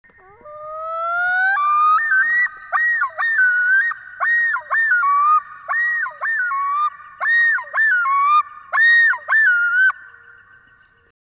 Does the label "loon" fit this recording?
Yes